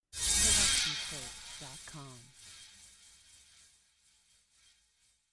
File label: anime laser